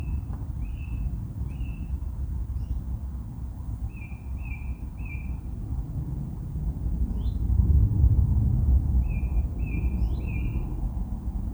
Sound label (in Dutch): lijster Zanglijster Turdus philomelos; dieren